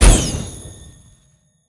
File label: archer shoot light arrow